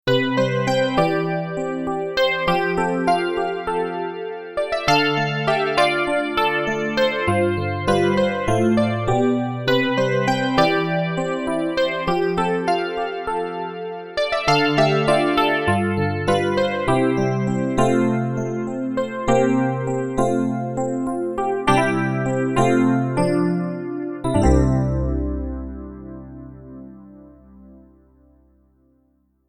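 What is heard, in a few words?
Electric Piano 2, pianino cyfrowe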